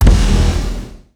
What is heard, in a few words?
planet killer fire; apocalypse